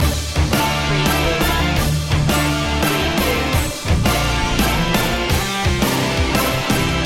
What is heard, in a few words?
8bit rocktronic loop